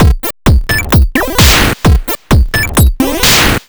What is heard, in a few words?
Beat Loop 130bpm 8Bit Beats; chiptune; producerloops